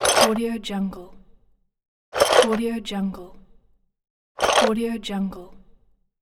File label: tools